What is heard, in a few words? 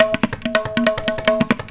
140 TABLAS, Indian Traditions; USB Soundscan vol.45; AKAI